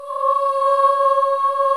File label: Boy Choir C#5